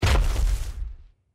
jump down, power armor; clothing system

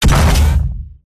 footstep; gow; silverback

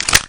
mp5 clipin, weapons; mp5navy

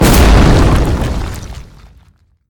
impact generic

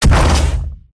footstep; silverback

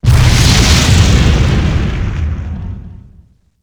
magic firebolt fire

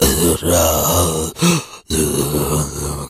zombie die

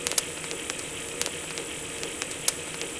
red idle, weapons; cso; trinity

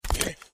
trog foot run, street trog

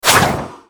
swing stab charge

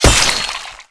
glaive hit

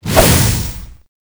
magic arrow fire; queen girl

